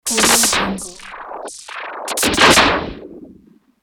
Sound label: starship overhead flares in